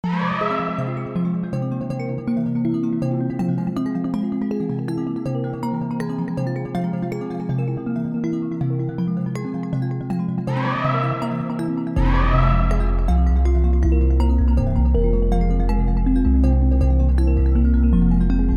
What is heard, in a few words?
Instrumental